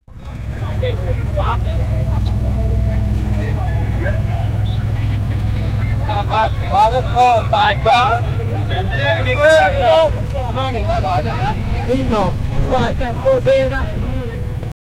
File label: village 1700s you hear th; historical